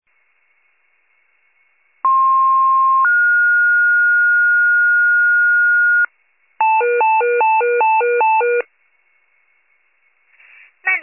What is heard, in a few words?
fire, pager alert